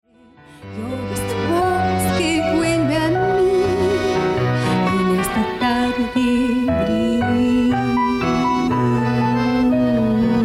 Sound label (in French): tango milonga chant